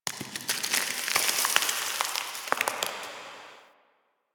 Stone Debris; Ambience; Warframe; The New War; Quests; Zariman

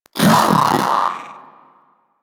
Vocal Bark Double, Pets; Warframe; Zanuka; Zanuka Companion A

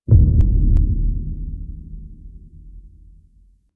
Courtyard Menu Stinger; Cinematics; Warframe; Duviri Quest

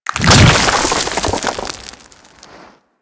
Desert Skate Burrow A; Warframe; Attacks

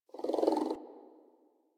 Puppy Interact Affection Closed Mouth; Enemies; Kubrow; Warframe

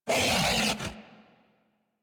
Kubrow Feral Vocal Melee Attack; Warframe

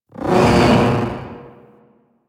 Air Bombard Projectile Whoosh; Warframe; Venus; Venus Air Bombardment

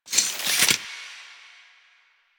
Cave Hi Tech Clicks; Warframe; Ambience; Stalker

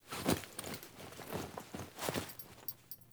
Foley Action Walk Cough; Characters; Player

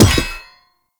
Blunt Heavy Impact Metal; Weapon Impacts; Player; Environment

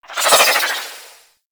Arch Wing Prime Dodge Roll Left

